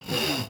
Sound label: Woodsaw; HapticSamples; Objects; Feel; NiceVibrations